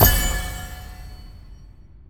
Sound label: scatter Hit; netent